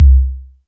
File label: Electronic Kick